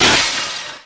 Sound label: jewel break